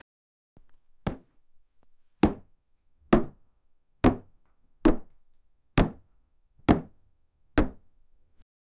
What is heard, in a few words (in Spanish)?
cosas chocando unas a otr